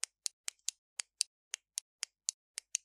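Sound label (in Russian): звуки фонарика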